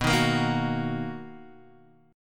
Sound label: B Major 7th B down Guitar DADGAD